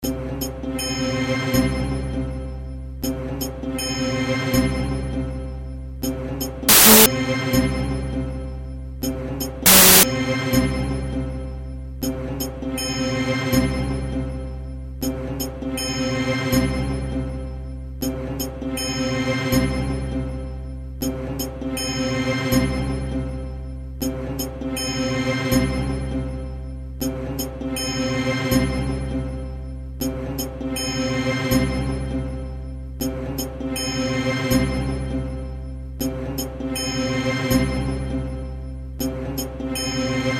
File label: Soothing Music